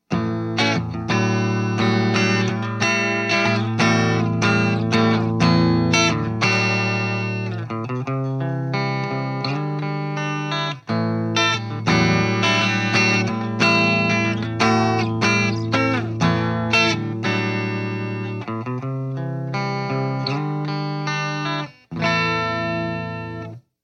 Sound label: m75 pvc 50w plexi clean